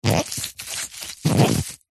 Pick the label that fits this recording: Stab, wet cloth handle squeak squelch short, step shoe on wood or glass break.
wet cloth handle squeak squelch short